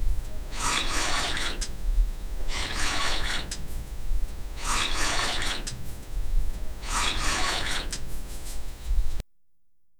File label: bow draw, bow and arrow